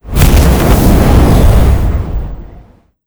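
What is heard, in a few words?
ghost house death, monster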